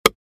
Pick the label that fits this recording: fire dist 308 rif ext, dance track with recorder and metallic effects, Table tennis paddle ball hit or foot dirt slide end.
Table tennis paddle ball hit